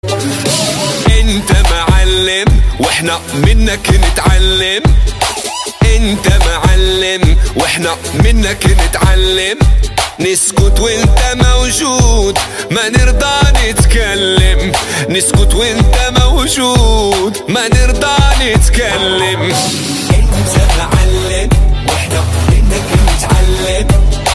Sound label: trance, ringtones